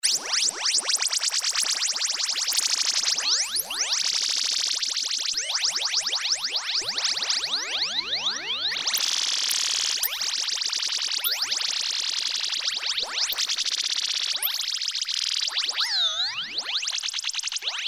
BIRDS, Roland Jupiter-8, Factory patches

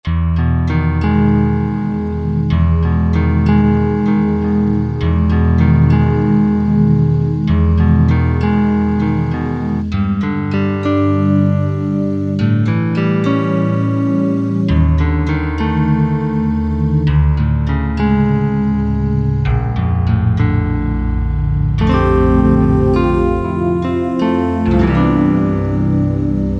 Magic Guitar, Korg Wavestation A-D

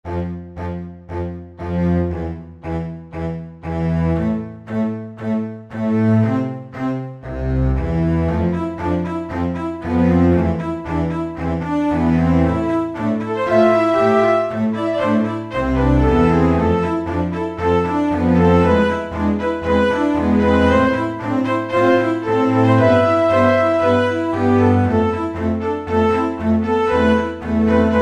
ChamberGrp, E-mu Proteus VX

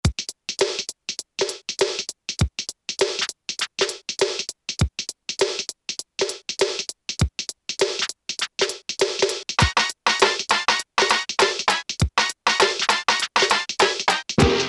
BEAT 5, Casio Rapman rap patterns

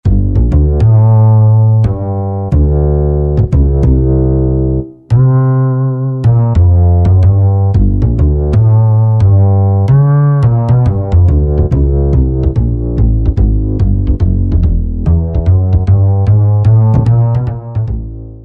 XFade Bass, Korg 05RW

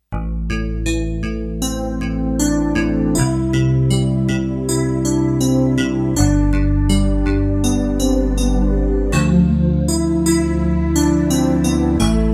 Metal Cmp, Kawai K5000S factory presets

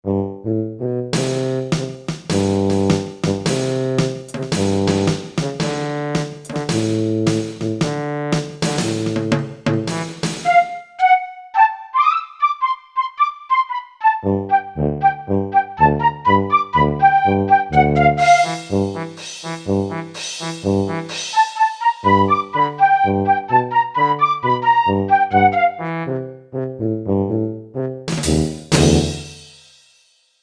Korg MSC-1S Street Band; Korg MSC-1S Variety

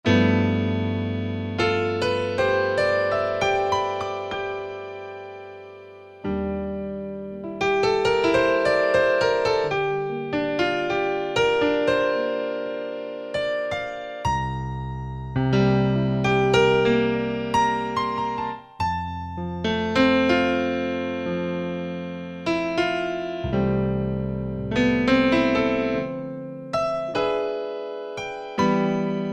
GRND A, Piano, Yamaha A4000; Piano - Keyboards

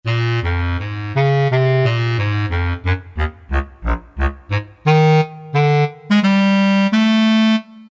BASS CL; Orchestral Winds; Roland SN-U110-06

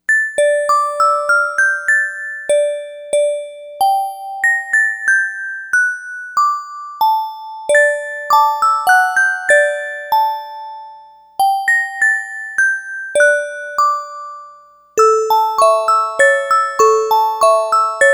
Glocken, Yamaha DX100; Factory Patches

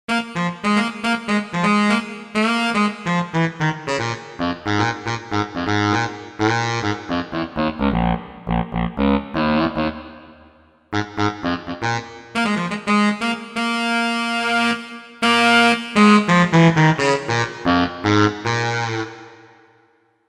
Tenor Sax, Roland JV-880; Factory Patches

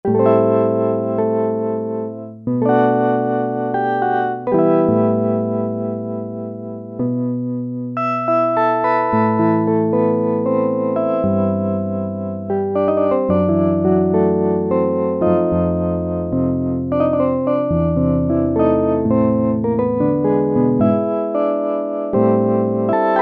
Piano 2, Roland Juno-60; Factory presets

